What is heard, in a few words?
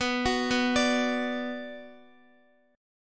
B Minor B Guitar; arpeggiated; JGuitar